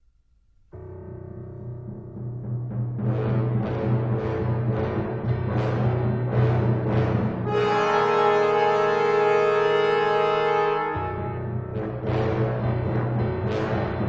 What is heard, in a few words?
Piano Concerto No 1 Allegro Moderato; Bela Bartok